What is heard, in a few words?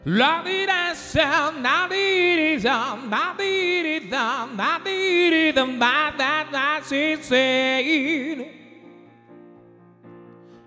vocal